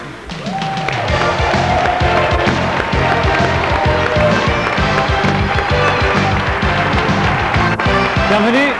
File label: Fanfare, Regular guest fanfare; This is used when a weekly guest, such as the martial arts expert, enters the arena.